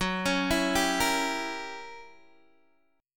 F sharp 7th Flat 9th F sharp down Guitar Open G

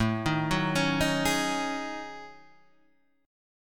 F sharp Minor 6th Add 9th, Guitar

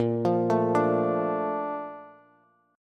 Bb Major 7th, Bb, down, Bass; BbM7 arpeggiated; JGuitar

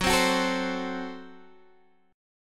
Gb Diminished, down, Guitar, Standard; strummed; JGuitar

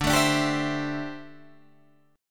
D 7th Suspended 2nd Suspended 4th, Guitar Open G

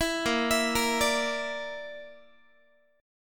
A sharp Major Flat 5th, Guitar; A#Mb5; arpeggiated; 19,18,19,20,18,x

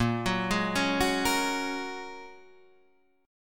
Bb Minor 7th Sharp 5th, Guitar, Half Step Down

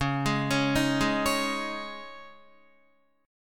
Db Suspended 2nd Guitar 4E,4A,5D,5G,5C,6F; arpeggiated; Dbsus2; JGuitar

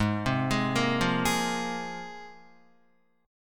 G sharp 6th Add 9th, Guitar; G#6add9; arpeggiated

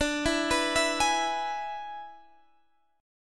E 7th D, guitar

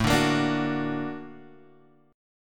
Bb Augmented Major 7th A

